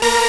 synth; osu

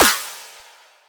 burst big clap

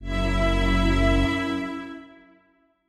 Strings

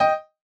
chorus chord G6 D#6

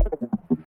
scratch; osu

